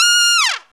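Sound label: Trumpet Fall, Roland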